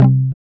GUI C, ACOUSTIC

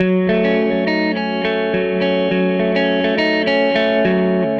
Gretsch Guitar Loop

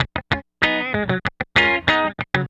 Guitar, 70s Analog Funk

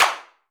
CLAP, HAND CLAP; AKAI S6000 CD-ROM - Volume 5

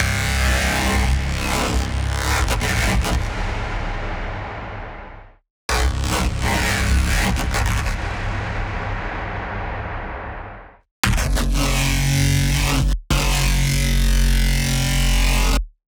F-F# Razor Bass Synth; neuro; BIG5AUDIO

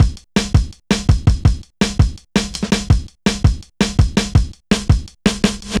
Persistent, Jungle, Drum Loops 165.5; Zero-G Jungle Warfare 1, 2 & 3

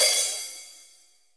HH MED, AMB KIT, Real Mega Drums

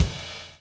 DOWN, DOWN KIT 78

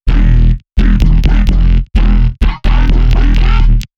Deep Wide Growl, bass

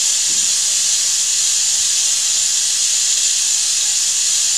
STEAM; SFX

